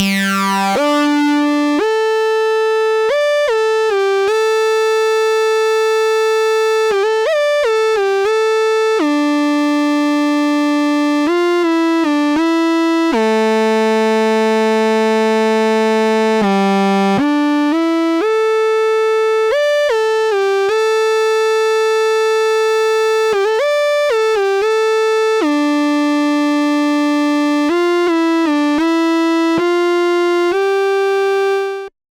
DISCO SYNTH, 117 BPM, Cm

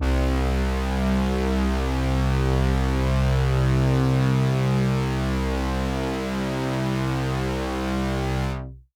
Pad C, Synth Prophet One Shots; Electronisounds

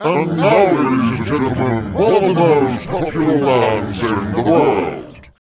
VOICE FX, Dance Vocals

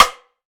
Rim Live Feel; LIFEFORMS

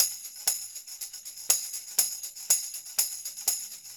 tam; Percussion

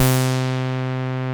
TEISCO; Masterbits; Vintage Voltage

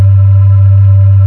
BLOW G1, PIPE; AKAI S-Series CD-ROM Sound Library VOL-8

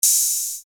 Hat, EuroDance

